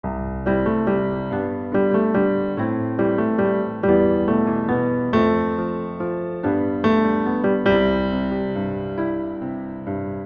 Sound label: Piano Alt